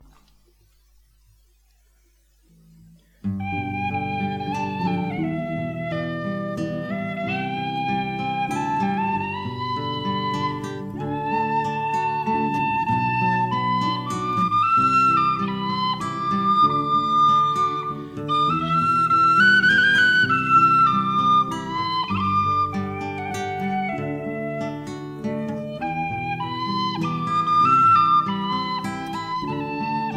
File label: for tin whistle and low whistle